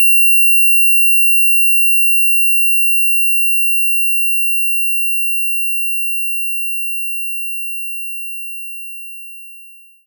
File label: Cold Glass Wave